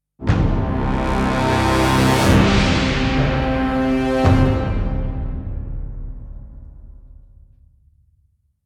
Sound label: Epic Orchestral Stinger